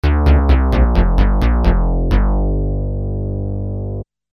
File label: bass